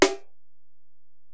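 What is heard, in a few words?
Acoustic Snare, Alesis DM-PRO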